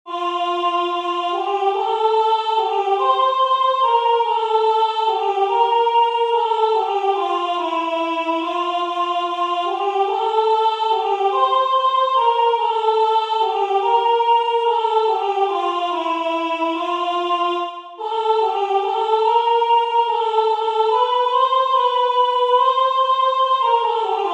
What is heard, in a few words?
Soprano; ALS ICK U VINDE